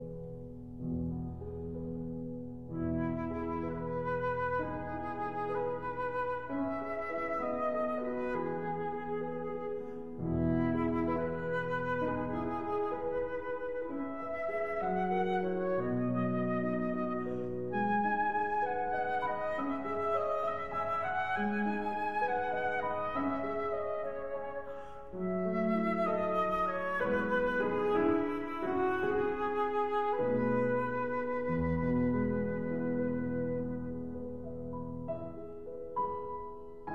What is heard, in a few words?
Berceuse (Lullaby) for flute and piano